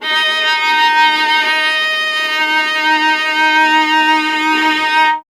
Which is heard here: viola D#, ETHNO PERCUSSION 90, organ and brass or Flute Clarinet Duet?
viola D#